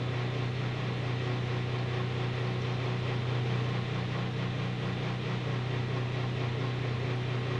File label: vent; ambience; AssaultCube